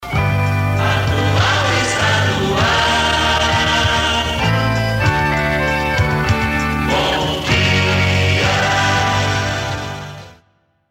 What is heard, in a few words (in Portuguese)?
Radio Atual SP vinheta